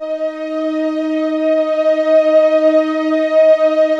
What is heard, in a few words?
PROPHET VS, Vintage Keyboards; Big Fish Audio - First Call Vintage Keyboards